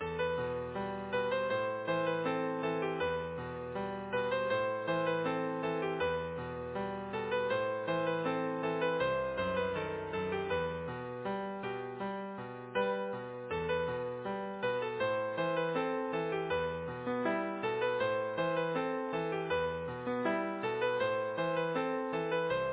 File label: Easy Piano